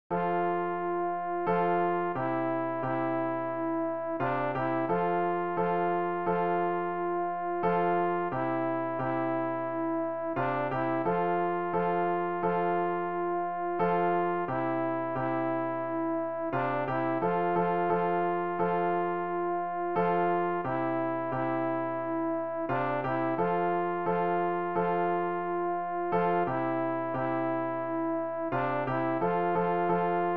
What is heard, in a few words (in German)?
alt, Übehilfen für das Erlernen von Liedern; ref gesangbuch; rg 289 unser vater im himmel ostkirchlich